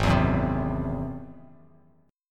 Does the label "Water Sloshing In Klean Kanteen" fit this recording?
No